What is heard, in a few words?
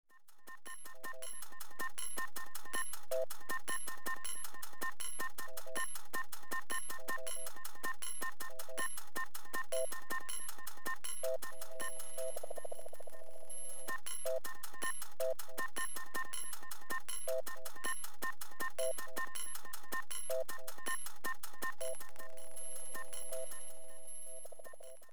Flip; anelok